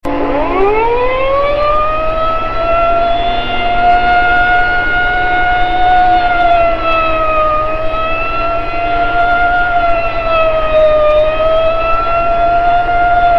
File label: Fire Call, sirens; Dupree 2001